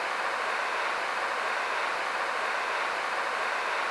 idle, weapons; tfa cso; m3 shark

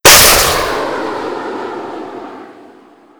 rpg fire

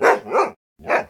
idle, dog

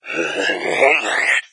chatter, hazmat zombie; doom3

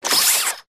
gunstand servo; gow; turret judgement

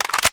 mag in, weapons

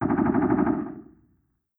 fog shooting

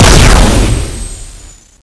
boom; tfa cso; starchaserar

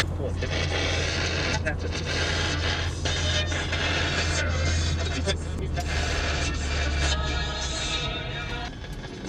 radio; car

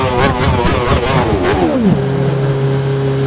slowdown highspeed, f1, cars; lwcars

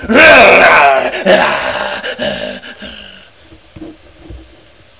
fast zombie alert

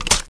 clip out; tfa cso; bendita

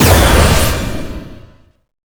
attack magic; queen girl

